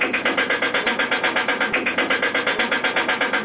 Machinery

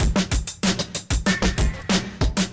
Hip hop Beat Vocals

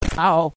Kick

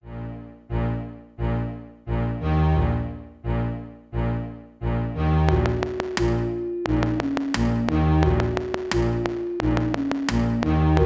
Midi

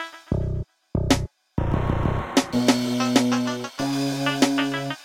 HipHop Drum Loops

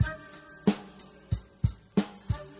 Jazzy Hip Hop

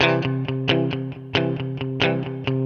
Muted Rock Chords

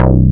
Moog Bass Hit

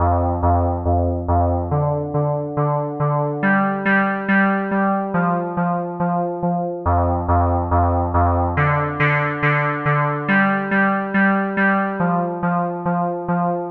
Synth Loop

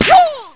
Karate Chop Sound

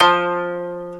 Japanese Koto in F2

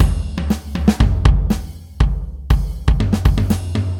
Hip Drama Beater

